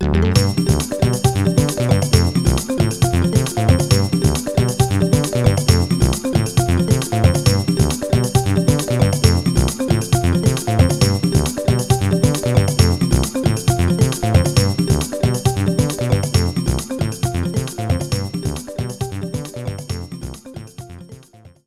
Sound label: Rumble Item; mario party 4 original soundtrack